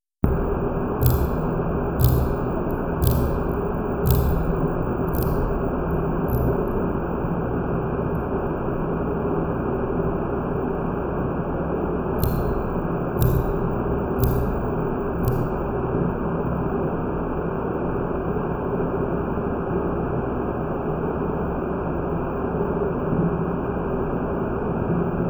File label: thumps